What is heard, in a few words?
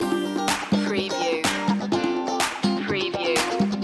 disco loop